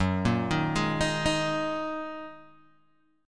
F sharp 6th Add 9th F sharp Guitar Open G

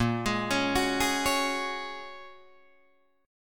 Bb Minor 7th Sharp 5th, Guitar, DADGAD

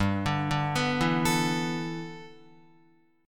G 6th Add 9th G Guitar, chord; G6add9 arpeggiated; 2,5,2,4,4,5

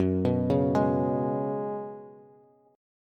Gb Suspended 2nd Suspended 4th, Bass; Gbsus2sus4 arpeggiated; 14,14,11,13

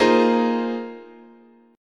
Bb 9th Suspended 4th, chord, guitar

